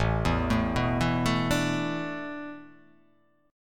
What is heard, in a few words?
A Suspended 2nd Suspended 4th; Asus2sus4 arpeggiated